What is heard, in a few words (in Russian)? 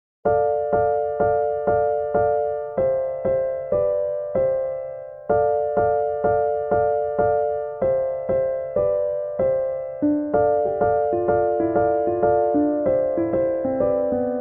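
pianino muzika, piano music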